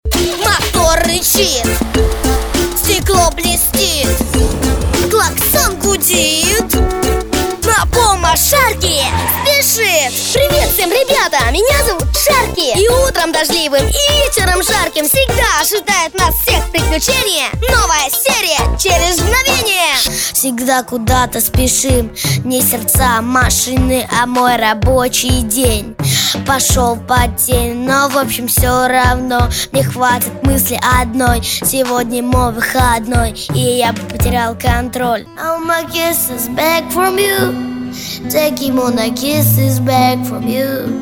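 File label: voc, Russian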